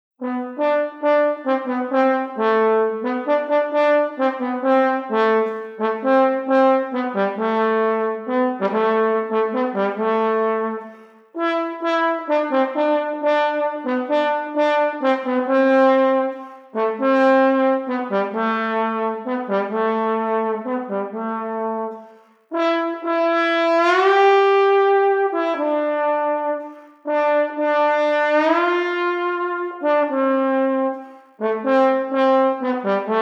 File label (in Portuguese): trombone; Conhecendo Instrumentos Musicais